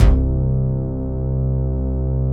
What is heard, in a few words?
BASS C; MONDO BASS; Greytsounds; Scott Peers Sound Engineering